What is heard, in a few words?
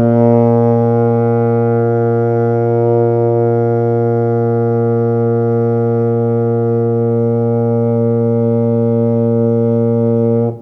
tuba Bb2